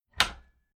Household Door Old Spherical Latch Opening Quick

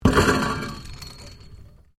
MECHANICAL CLANK RUBBER METAL; Variou smechanical sounds; Clanks (Sound Effects:Objects)

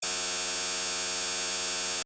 Tech move loop, Movement Loop, High Tech; Tech Sound Effects; Tech (Sound Effects:Science Fiction); Stuart Duffield

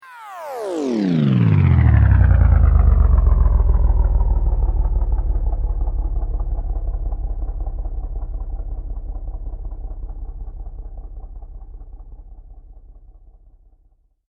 Sweep Mechanical Slow; Created sweeps, swooshes and other movement effects; Sweeper; Sweepers (Sound Effects:Sound Design); SoundEffects-Foundation4

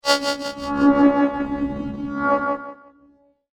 Some Magic For You, magic sound effect; fantasy sound effect; Andrew Fleming; Twistedtracks

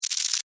Insect, Movement, Shake, Bug; Sound Effects:Animals:Insects; Blastwave

